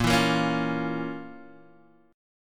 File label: F Major 7th Suspended 4th Sharp 5th A sharp